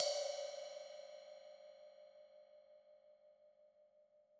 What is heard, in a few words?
Trash D, drum